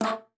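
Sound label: A3, bass flute; Xsample VOL-2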